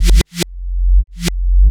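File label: DRUMLOOP ELECTRO 140; Techno2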